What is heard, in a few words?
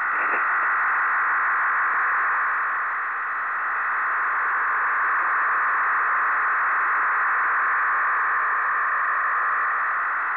websdr recording 7045.0kHz